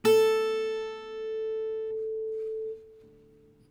Guitar; spektr analys

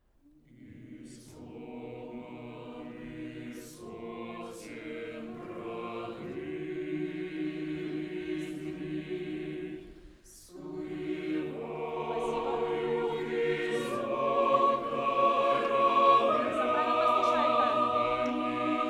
neumann KM XY normalized, choir

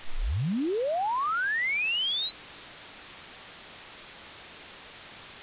dither; dithering noise shaping

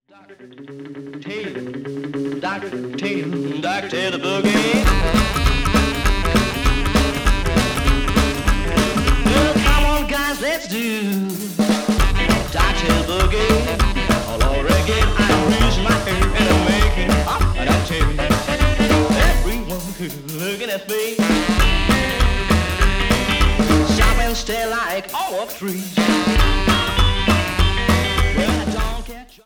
Sax compress LA-2 MIX with band; teletronix

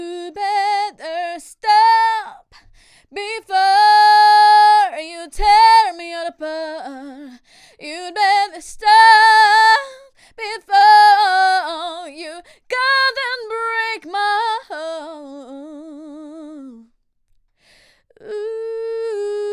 nady scm960, vocal

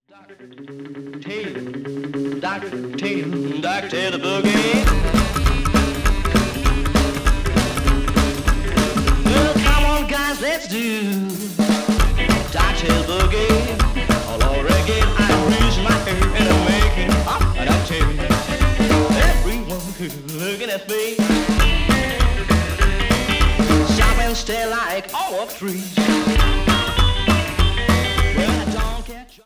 Sax no compress MIX with band; teletronix

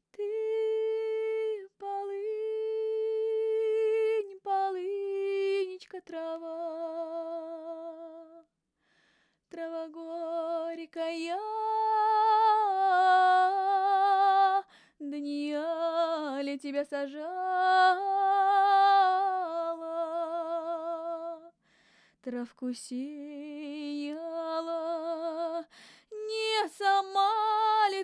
folk

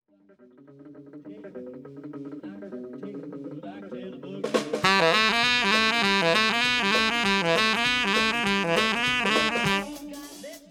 Sax compress LA-2A; teletronix

